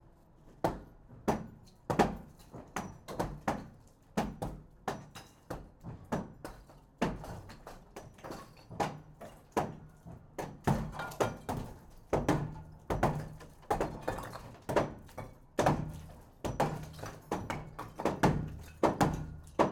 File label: Work, Hammer, Wall, Concrete Block, Sledgehammers, Pair, Hit, Debris, Patient - very faint background; very faint background construction; Airborne Sound; Tools